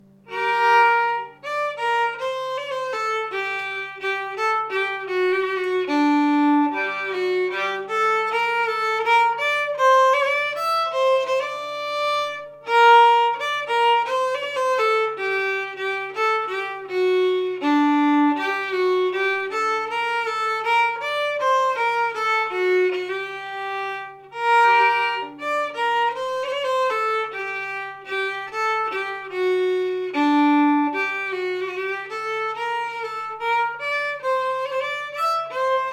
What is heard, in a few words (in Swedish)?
Kong homble melodi långsam